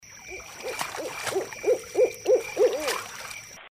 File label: strix huhula